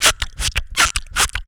VOCDRUM, MOUTH PERC; Best Service; Hyper Dance

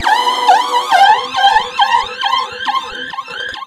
138 FRESH; USB Soundscan vol.20; Fresh Disco House I; AKAI; DISCO ADD

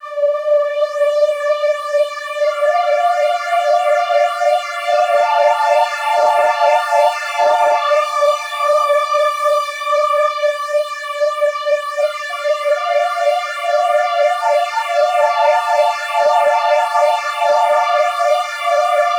Fizzy Risers; Sonic Foundry (Sony Creative Software); Electro Lounge; 100 Rate Plan

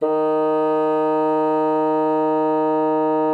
bassoon, D#3; Xsample VOL-6

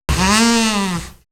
Reed; 90 sSampleCDs; Coldcut's Kleptomania VOL1; Brass n Wind

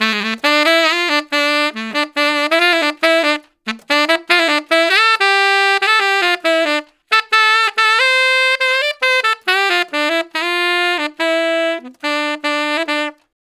Tenor Solo, Jazz, Traditional, Tenor Sax; Sonic Foundry (Sony Creative Software); Jazz Solos and Sections; Tenor Loops

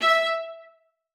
MART E5, VIOLA SPICC; Xsample VOL-5; 90 s Sample CDs

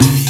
PERC, COOL PERCS; Crypton - DrumBase5000

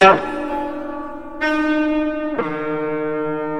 KOTO LP FX, Asian Traditions; USB Soundscan vol.60; AKAI; KOTO FXLP

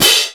Piatti Closed f; Digital Sound Factory Virtuoso

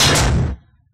techno kit fx

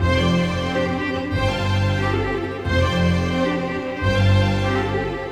Strings; Sonic Foundry (Sony Creative Software); Orchestral VOL-2; Modern 25

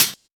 Closed HH

